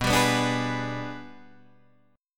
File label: G sharp Minor Major 7th Flat 5th